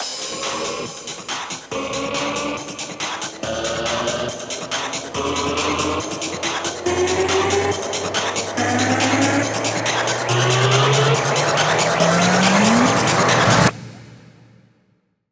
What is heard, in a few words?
140BPM 8Bars, HandsUp & Trance Fills; OhUhAh; Vengeance Samples